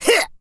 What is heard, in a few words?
attack; acheron